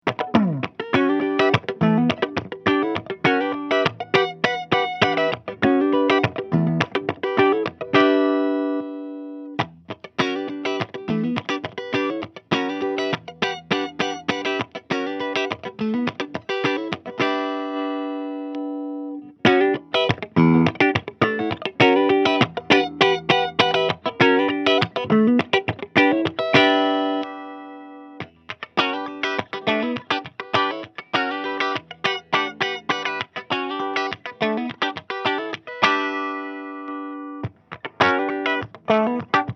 Solar Guitars A16D Clean

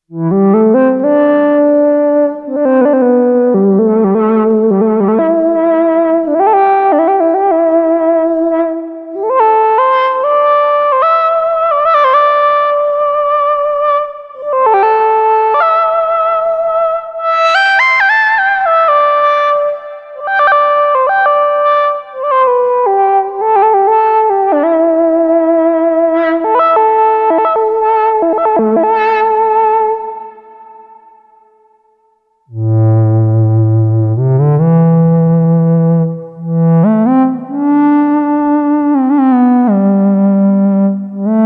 Behringer MS-5 vs SH3A

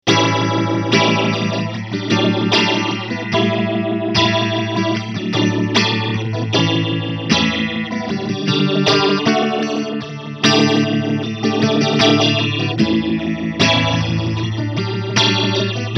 Clean; Eventide Riptide

